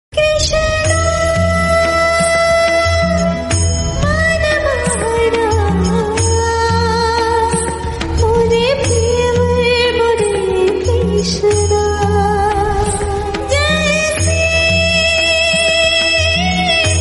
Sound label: devotional; krishna